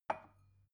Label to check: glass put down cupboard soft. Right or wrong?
Right